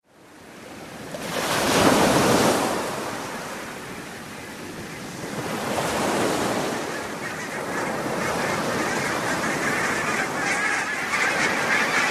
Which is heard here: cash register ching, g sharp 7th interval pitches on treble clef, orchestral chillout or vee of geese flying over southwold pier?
vee of geese flying over southwold pier